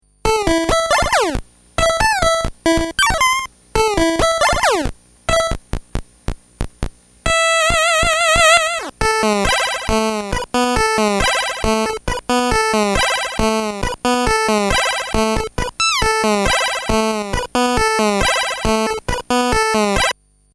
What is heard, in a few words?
dmg click